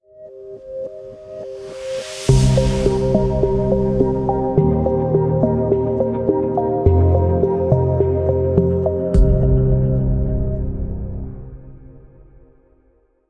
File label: Podcast Outro; Aramco; sonic